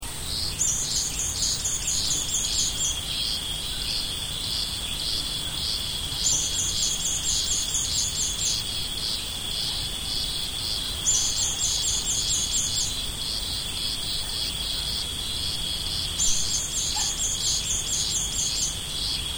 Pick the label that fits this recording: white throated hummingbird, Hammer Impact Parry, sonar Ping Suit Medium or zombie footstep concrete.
white throated hummingbird